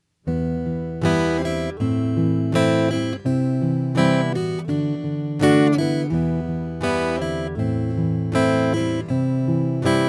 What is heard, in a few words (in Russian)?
Boj s pereborom